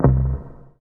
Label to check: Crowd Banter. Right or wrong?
Wrong